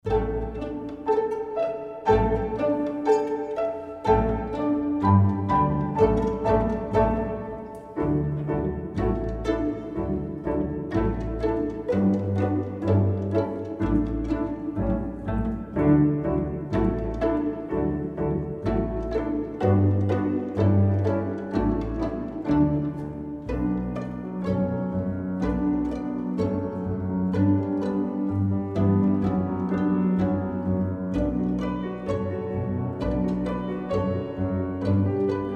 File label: Ping Pong Pluck